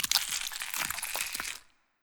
Circular Saw Gore

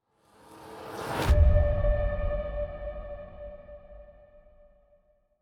Music Elec Trans Pre race end; Forza Motorsport 6 Apex

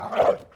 Mutt Growl

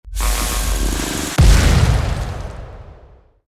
Skill Self Destruct; starbites

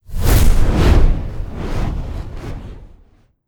Hawk Fire Burst; Legend of Keepers (gamerip) (2019)

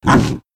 Monster Hurt Small; Monster Ralph

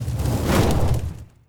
Attack Magician

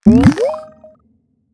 Checkpoint Respawn

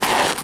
Sand footstep; starbites

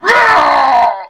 Male Die; Mars Sortie

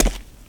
Foot Step

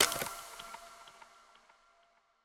Camera Photo-Place

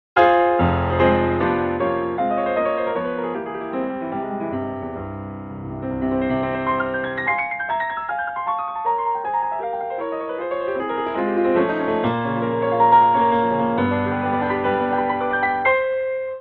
piano weak; BanG Dream! Girls Band Party!

